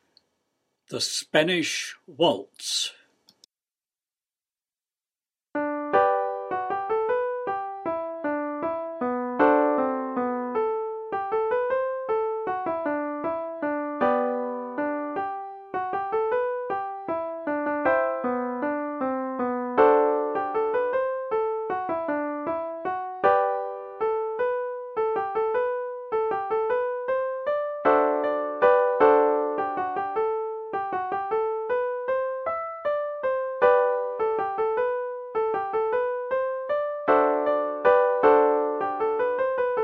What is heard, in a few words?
waltz